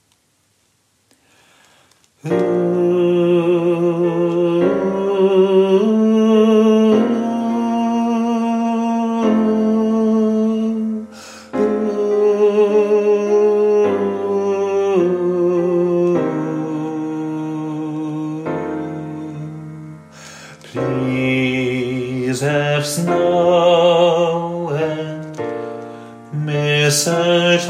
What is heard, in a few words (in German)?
Bass, Popchor